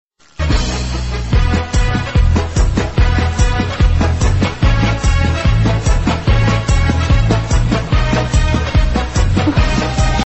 musical circus

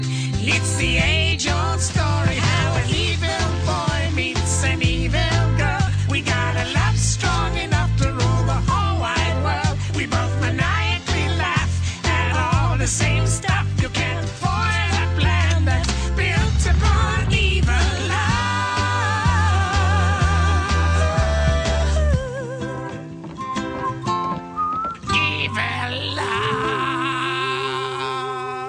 evil love

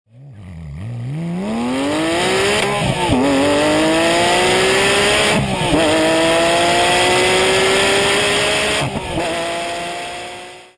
0 300 km h porsche 911 gt3 rs 4 0 motorsport

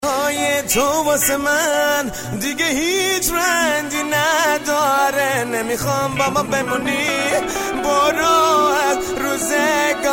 arabic tone

